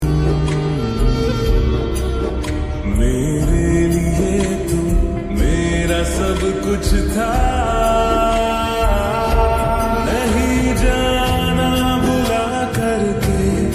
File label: slowed reverb lofi song